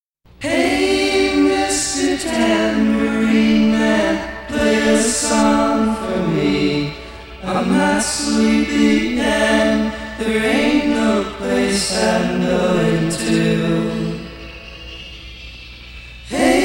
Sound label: vox